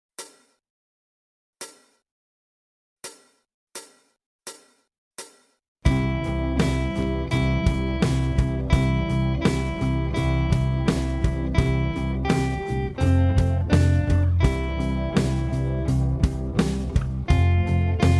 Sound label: Jingle Bells slow